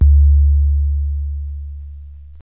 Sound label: dull boom